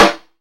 natural acoustic snare sound D# key, Royality free snare one shot tuned to the D# note.